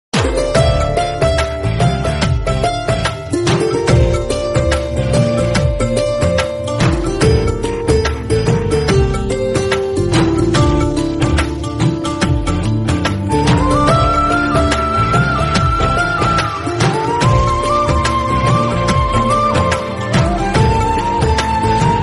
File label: instrumental ringtone